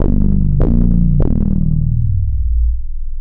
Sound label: BASS, Drum & Bass, 150 BPM; Ueberschall; Drum & Bass Resonance